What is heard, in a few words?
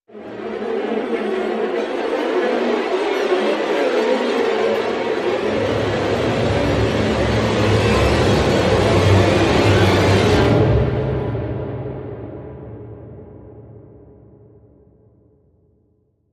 Rise Long